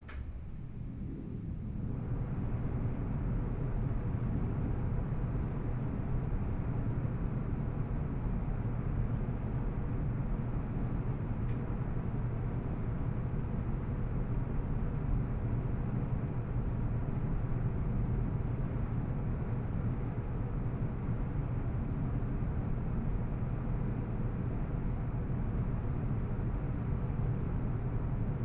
Air Conditioner Vent 1; On, Run With Low Range Rush Of Air Smooth, Off